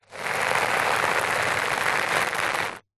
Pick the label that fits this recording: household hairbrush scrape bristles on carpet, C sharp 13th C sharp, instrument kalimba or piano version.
household hairbrush scrape bristles on carpet